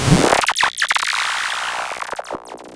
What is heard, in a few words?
Cwejman S1 Murky Filter